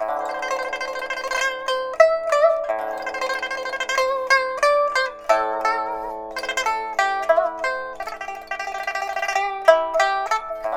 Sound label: PIPA, 90; INIS - Opium